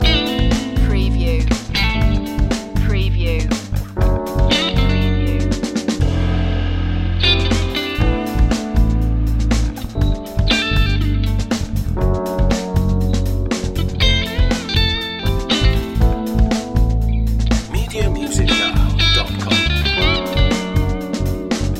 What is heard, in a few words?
positive vibes loop